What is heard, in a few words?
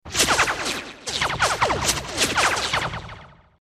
Laser Zaps Fast By